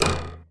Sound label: bow; diablo2